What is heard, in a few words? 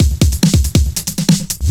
Breakbeat; Vengeance Samples; VEC2 Loops